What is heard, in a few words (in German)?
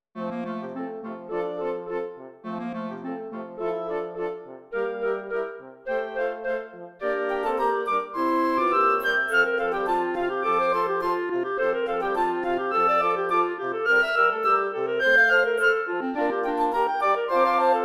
Floete